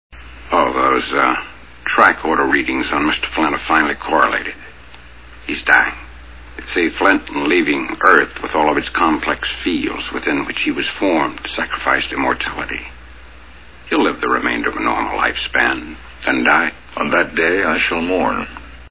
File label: dying